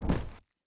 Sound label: bear foot back; npc